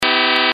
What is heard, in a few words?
expanded drop